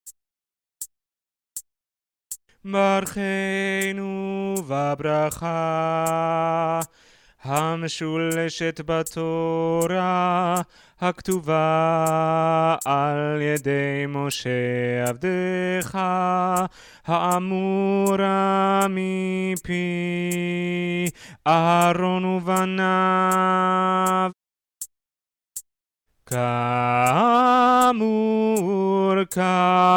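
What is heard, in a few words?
bass, choir music; BCC choir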